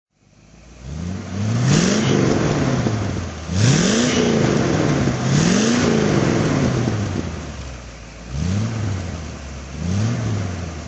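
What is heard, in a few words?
Facel Vega Facel 2 throttle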